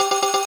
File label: count Up Big Win Loop; robin hood